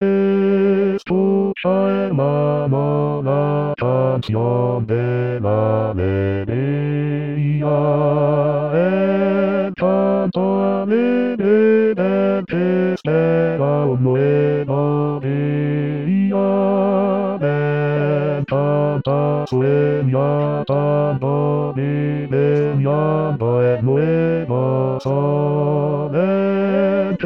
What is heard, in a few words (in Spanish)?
himnoalegria B voz, con voz; Coro "Con dos Bemoles"